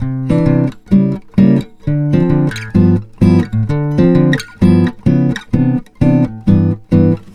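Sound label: Ambient Stream; Fashion Studio Paris Collection; nds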